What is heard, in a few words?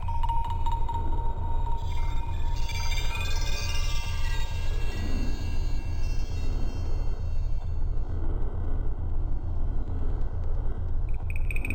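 machine tool ringing close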